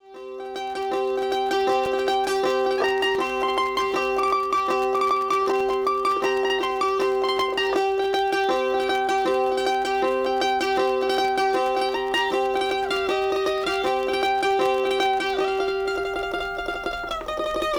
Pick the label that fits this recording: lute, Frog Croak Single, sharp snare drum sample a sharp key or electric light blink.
lute